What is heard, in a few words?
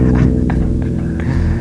laugh